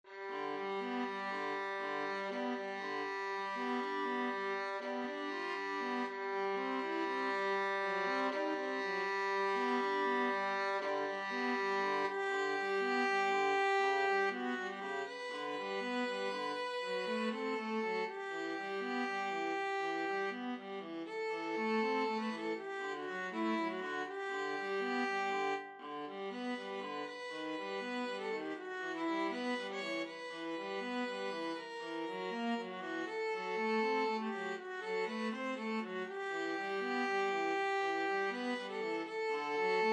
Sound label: violin viola duet